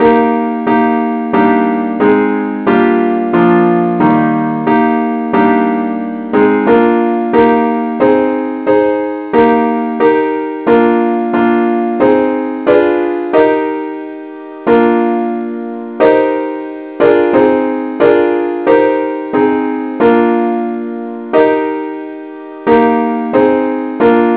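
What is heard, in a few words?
advance accompaniment key E flat; christe Sanctorum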